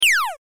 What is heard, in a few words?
laser; arms